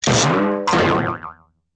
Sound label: brong and boi yoi yoi yoi yoing